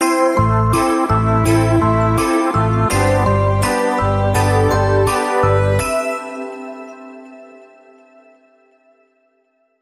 No Drums Sting

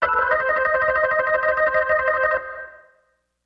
Organ Trill

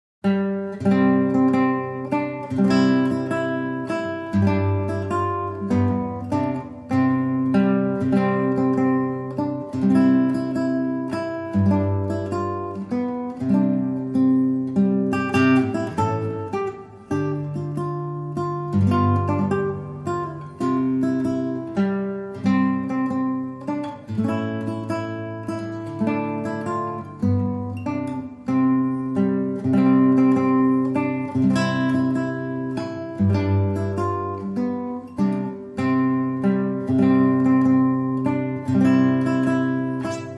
Solo Guitar

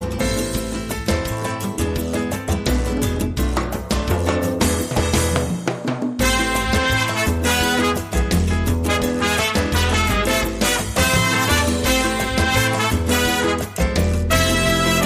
Sultry Rhythms

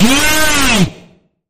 Sharp Bend, D sharp; Sharp Bend Ahead

